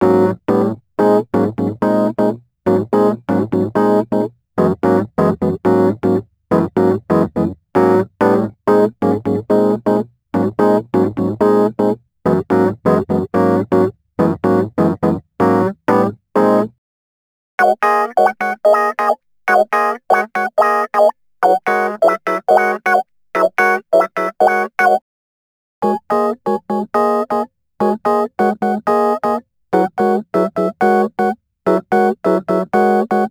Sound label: Rhodes Attacks; Ueberschall